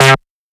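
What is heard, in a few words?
synth hit C minor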